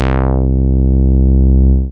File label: BAS Mini, BAS1SYN; Best Service Gigapack 2